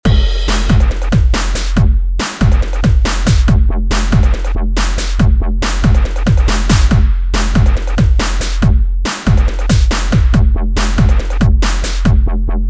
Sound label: Break, drum beat; Drum Blaster: The Ultimate Beat Generator, Sampler Plugin for Modern Producers